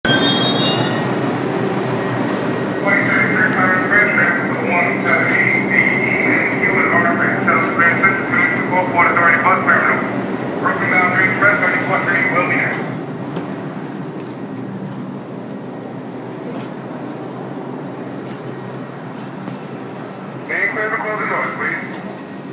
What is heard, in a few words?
train Columus Circle